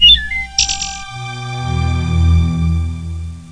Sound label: bird flap; Scrutiny In Great Round